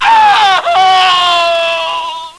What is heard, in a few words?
scream